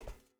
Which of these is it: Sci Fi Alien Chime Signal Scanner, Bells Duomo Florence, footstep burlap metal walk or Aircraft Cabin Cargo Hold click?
footstep burlap metal walk